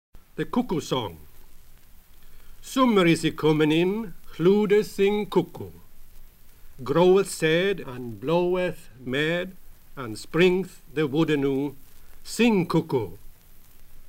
The Cuckoo Song c1225, A Thousand Years of English Pronunciation